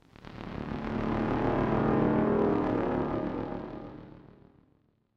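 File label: oracle ambience; assassin s creed chronicles india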